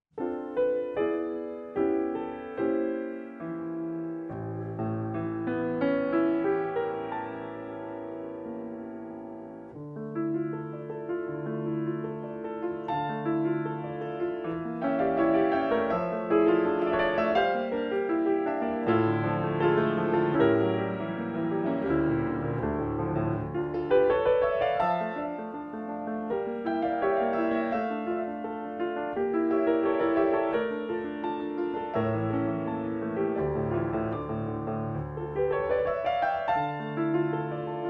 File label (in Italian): ELEGIA PER PIANOFORTE E SOPRANO